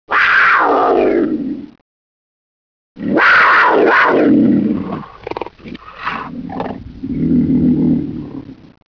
pantera

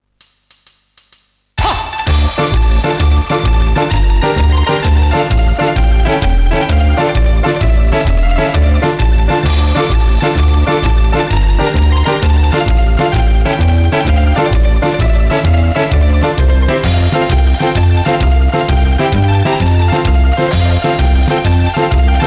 tarantel

